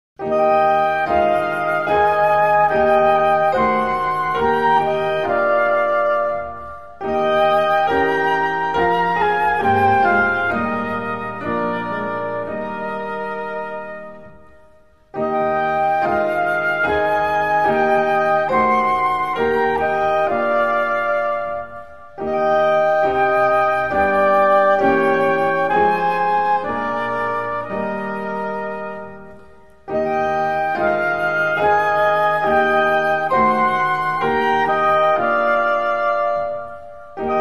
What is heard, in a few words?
instrumental; hymns spanish